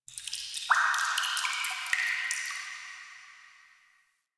Drips, Asteroid; Ambience; Warframe; Grineer Asteroid

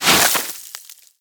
Sword Elemental Ice Whoosh; Warframe; Teshin; Weapons

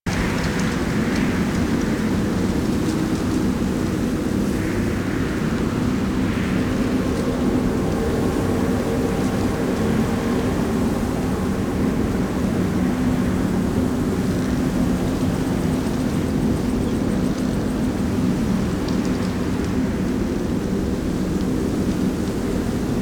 Diorama Landscape Loop; Duviri; Lua

